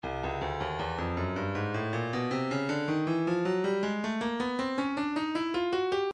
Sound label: breakbeat 079 bpm; Stylus Breakbeat Midi Files 075 079 Bpm; Shifted Jazz